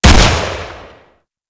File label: Double Barrel Shotgun Shot; Warframe; Weapons; Tenno